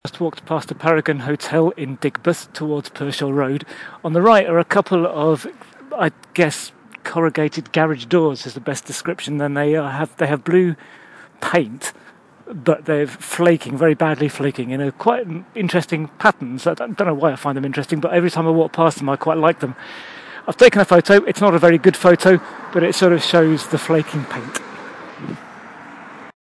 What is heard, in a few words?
flaking paint